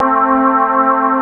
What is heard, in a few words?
MONKEY, HIPHOP; Rubber Chicken Software Sample Bank